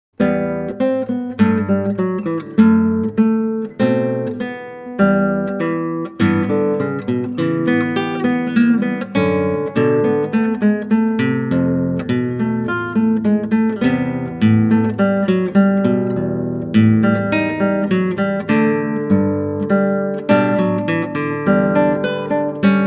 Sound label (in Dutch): Klassieke Gitaar; Bach 50 Solos